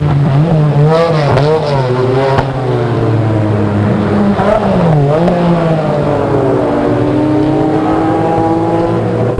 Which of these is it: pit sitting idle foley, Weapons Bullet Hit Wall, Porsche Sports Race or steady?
Porsche Sports Race